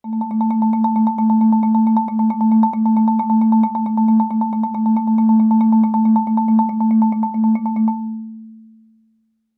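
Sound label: Marimba roll fortissimo A3; MIS Pitches - 2014; Percussion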